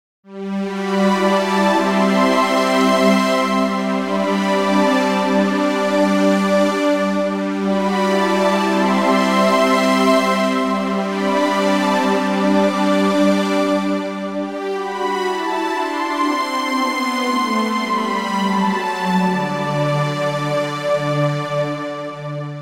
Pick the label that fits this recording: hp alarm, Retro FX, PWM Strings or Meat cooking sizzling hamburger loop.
PWM Strings